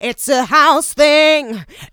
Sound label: voc, female vox, Vocal House